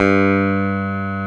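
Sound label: electric bass pick G1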